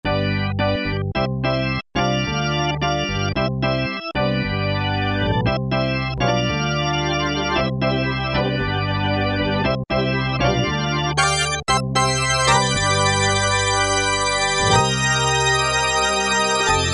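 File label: Pad Leslie like, E-mu Morpheus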